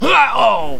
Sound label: death